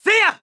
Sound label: Sword Spin; Link